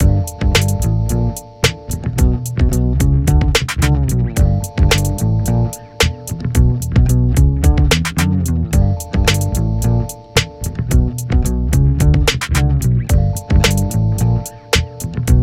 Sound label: groove